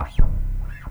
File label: BASS SQUE, Electric Basses; PlatinumPhattCD2; E-MU Producer Series Vol. 8 – Platinum Phatt